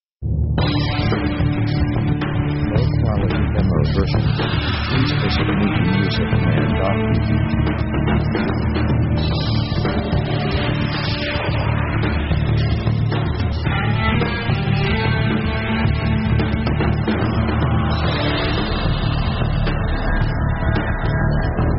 rock gothic thriller action; synth themes

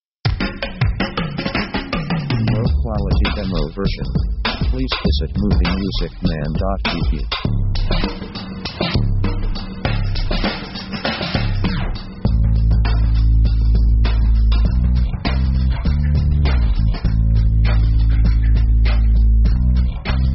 hiphop; synth themes

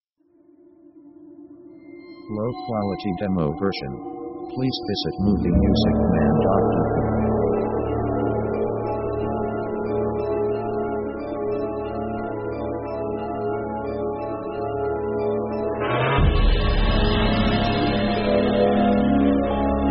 orc mystic; orchestra themes

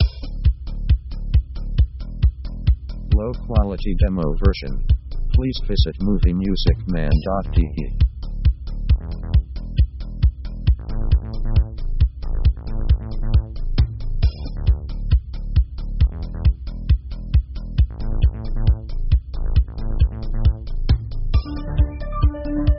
synth sequenced 135bpm; synth themes

